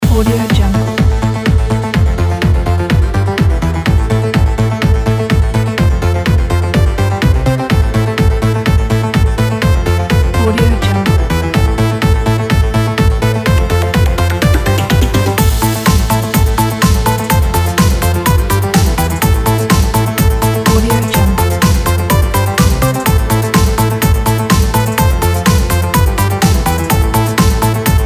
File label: electronic 80s